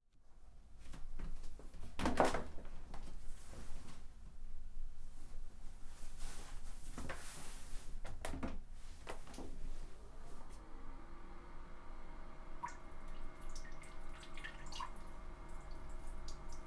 トイレでオシッコですッ!

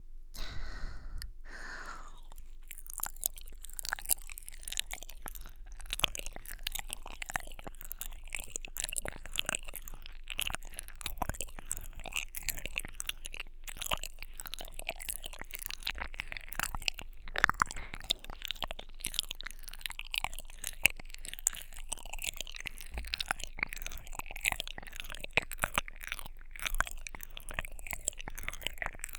耳かぷ舐めコース

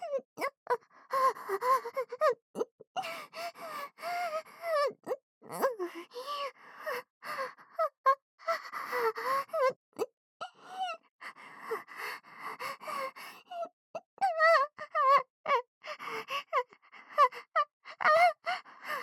本番喘ぎ; おまけループ（オナサポ）; 喘ぎ声; SE無

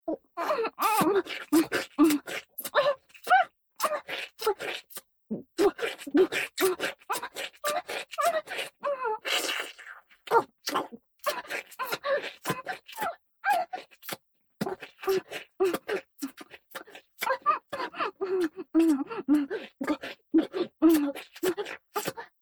フェラ咥え

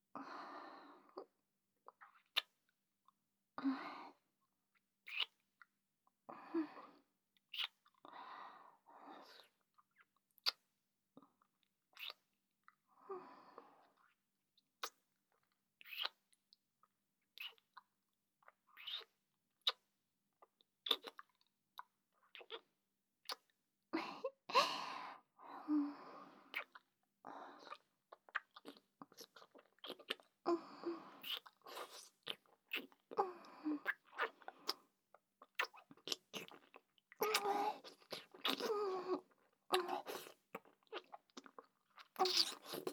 キス; SEなし

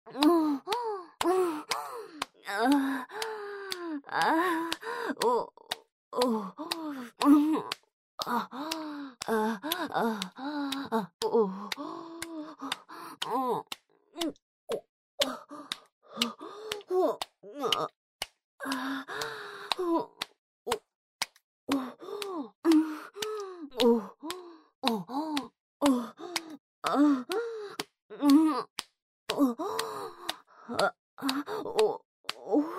オホ喘ぎ

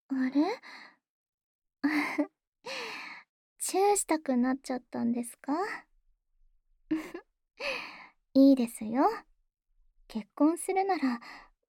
安眠用 キス

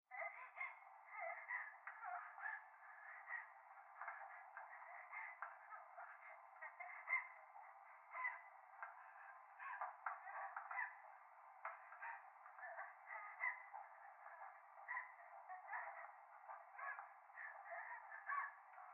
風呂 喘ぎ 電車

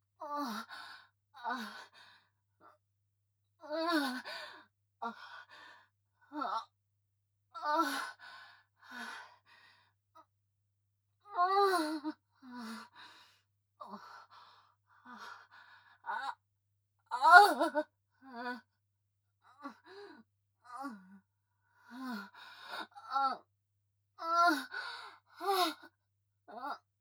正常位・喘ぎ・ゆっくり; ヘレナ

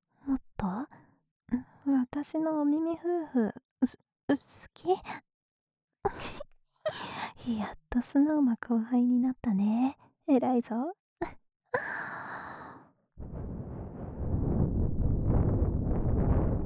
右 耳ふー, 環境音なし

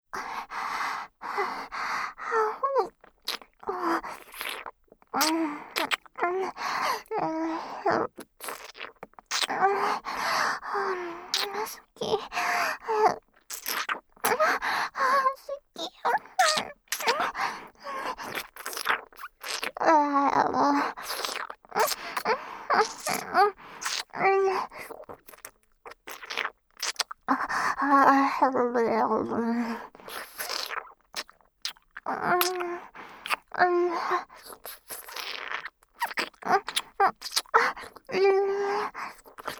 好き好きベロチューループトラック, ASMR

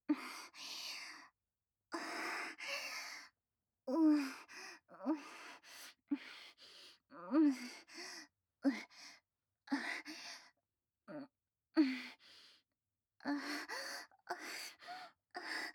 喘ぎ声; アイリス

